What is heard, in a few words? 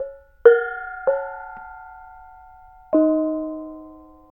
BONANG; Spectrasonics; Heart of Asia; BONANG PH